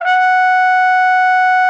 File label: F#5, TRUMPET S; Xsample VOL-3